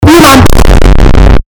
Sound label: vine boom bass boosted; your mom vine boom